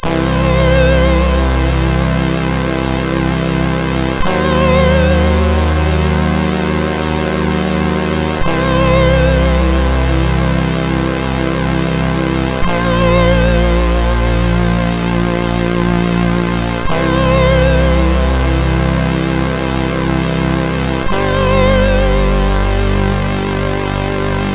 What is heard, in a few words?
E-Load loader, CSG8580R5; hvsc